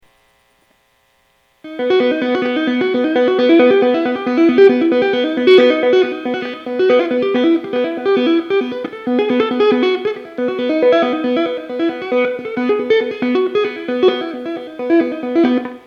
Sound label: rock